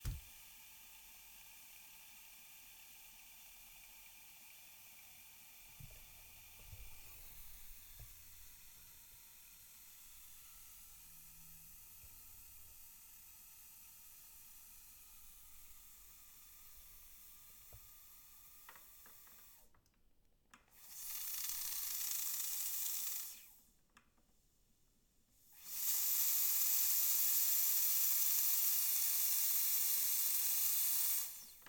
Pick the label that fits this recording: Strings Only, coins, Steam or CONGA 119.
Steam